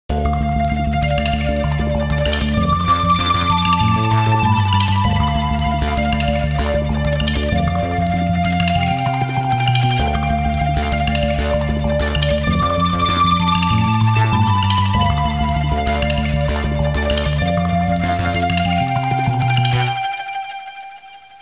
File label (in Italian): musica elettronica